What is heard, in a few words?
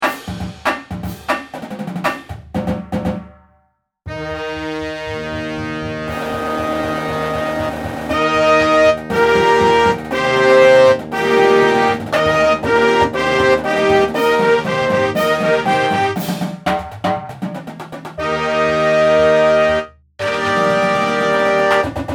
Holiday With Perc; ASB 2015 All Star Holiday